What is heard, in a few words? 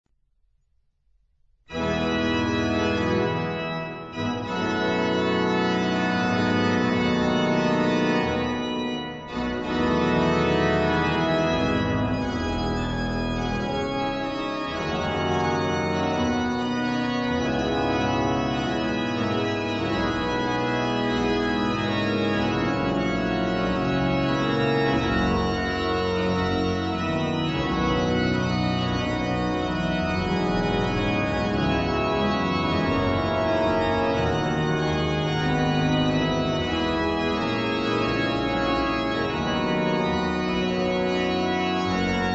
Bach Prelude Fugue in E flat